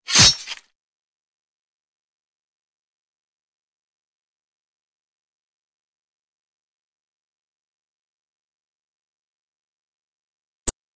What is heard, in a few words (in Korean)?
Fights, 싸움-전투; 헐리웃특수효과음